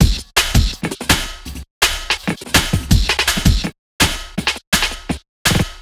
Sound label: Beat, Futurist Drum & Bass; Drums & Percussion